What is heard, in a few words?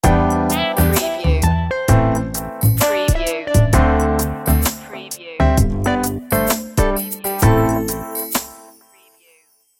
sand and sun stinger